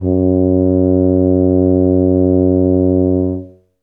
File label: tub F#1, Tuba; Best Service ProSamples vol.51 - Classic Orchestra 2